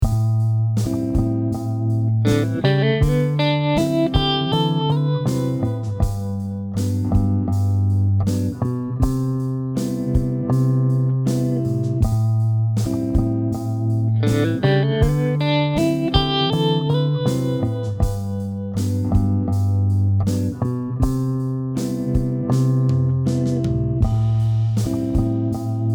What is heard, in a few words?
Am D7 G Maj7 C Maj7 Backing Track